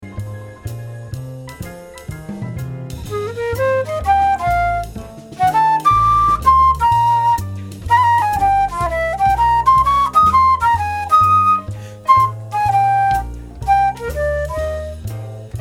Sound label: Dim Chord